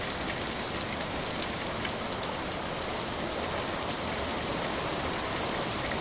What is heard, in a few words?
weather rain heavy; regional weather